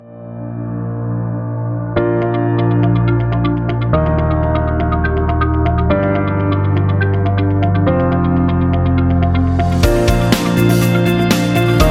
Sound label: Slide Show Corporate